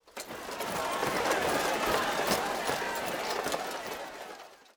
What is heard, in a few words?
army crowd